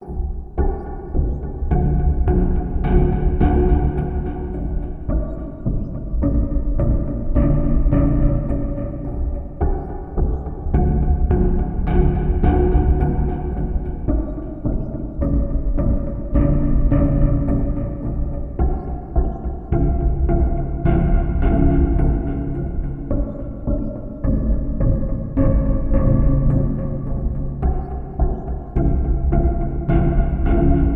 More Sewer Creepers, dark ominous

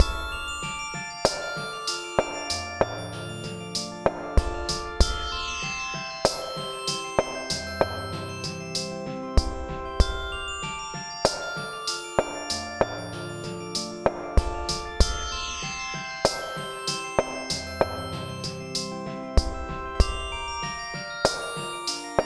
Quirky Magic, fantasy

